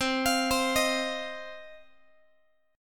C Minor Double Flat 5th C down Guitar 4A 4E 5A 5D 5G sharp 6C sharp; Cmbb5 arpeggiated; JGuitar